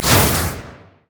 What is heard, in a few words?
shadow swing; LoL; zed stormninja